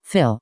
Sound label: Fill